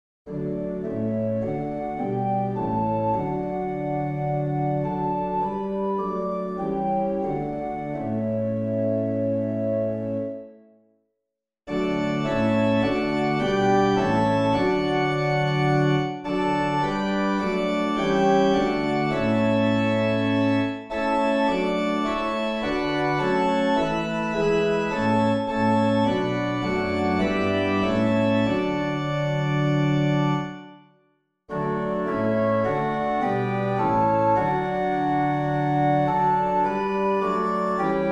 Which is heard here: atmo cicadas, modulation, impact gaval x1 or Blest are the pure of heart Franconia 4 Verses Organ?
Blest are the pure of heart Franconia 4 Verses Organ